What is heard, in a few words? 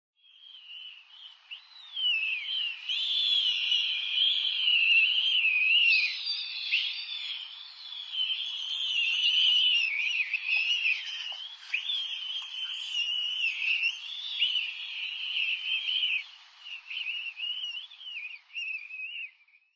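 Oriental Stork; koe; toyooka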